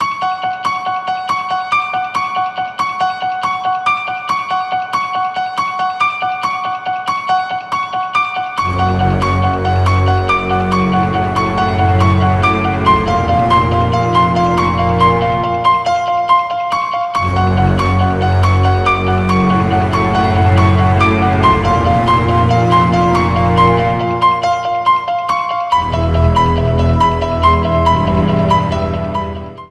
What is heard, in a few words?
halloween ringtone